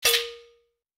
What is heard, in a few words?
Tribal close; Nokia; audio themes